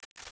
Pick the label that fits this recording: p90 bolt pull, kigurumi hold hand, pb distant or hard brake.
p90 bolt pull